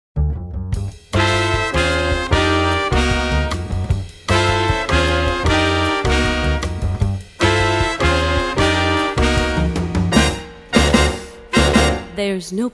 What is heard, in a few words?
Jazz Band; Seattle